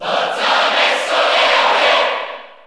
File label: Cheer Italian; Ness; SSBU